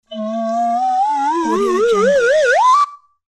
cartoon whistle rising

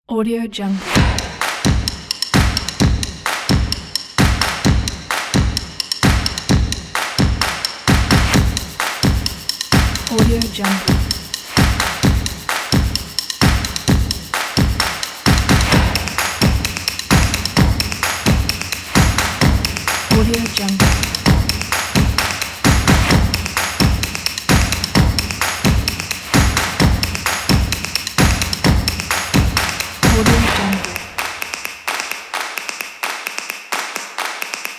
stomp corporate percussion; iluxissound

